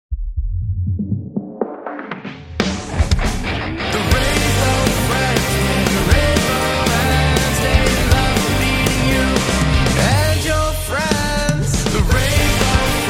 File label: rock version; rainbow friends